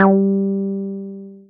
Synths BASS

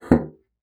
BASSOON; Xsample VOL-6

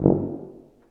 TUBA, Tuba stacc; Roland L-CDX-03 Disk 2

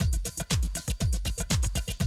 120, Classic Drum Loops

